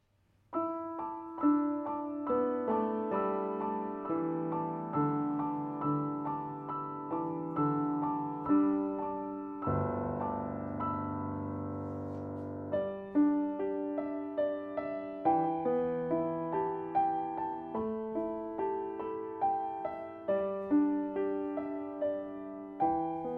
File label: Traditional (Korean Folk Melody): Arirang